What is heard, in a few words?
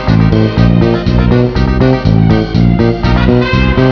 disco; nissan; disco2m